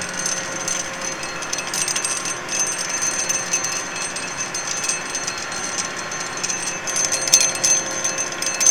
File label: LOOSE PIPE, Industrial; SFX